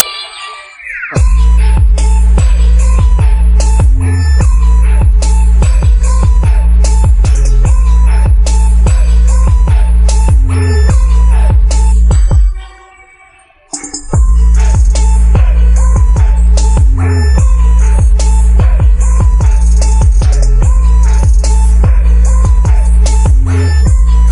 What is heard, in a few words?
merry christmas inst